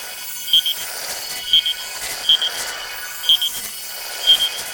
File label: Safe Deposit Box Key Loop; Objects; Storage Container; Infested; Warframe